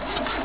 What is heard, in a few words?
cranking; fgdata